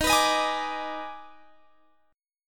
D sharp Major 7th Suspended 4th Sharp 5th, D sharp, down